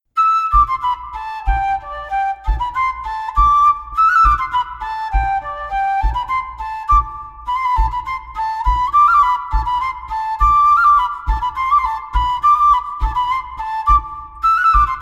Montagnarde

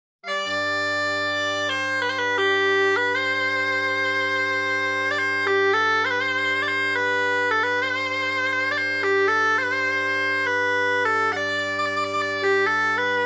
valse; La valse a Dede

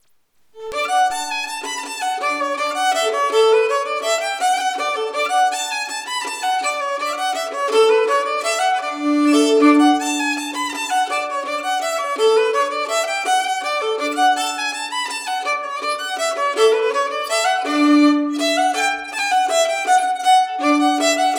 Mazurka

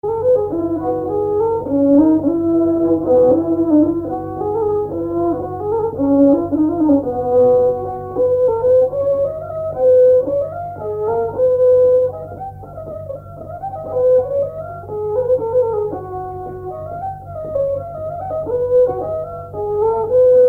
Marche; Marche du Negrou; Francois Maltieu

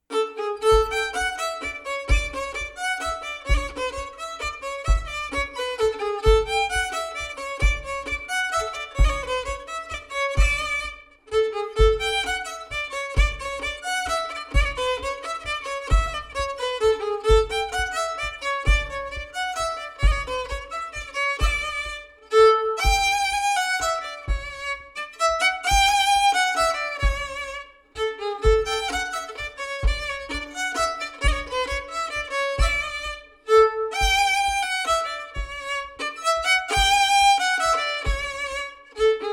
Valse; Les premieres valses 1ere livraison manuscrits regionaux

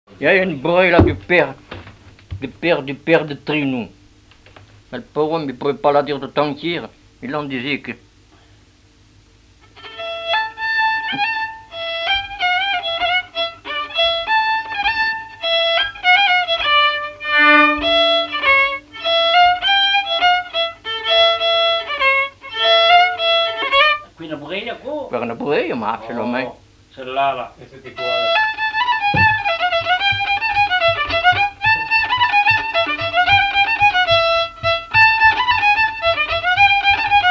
Bourrée; Bourrée du grand père de Trenou

